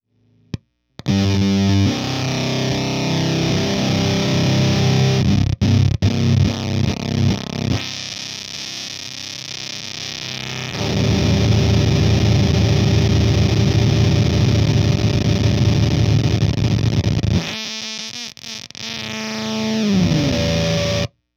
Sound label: Fuzz Yes way up; earthquaker devices